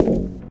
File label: glass, footsteps, walk; source engine; left 4 dead 2